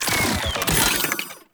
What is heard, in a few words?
Sealed Cache Terminal Shutdown; Warframe; Zariman